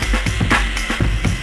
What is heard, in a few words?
jingle, breakcore Breaks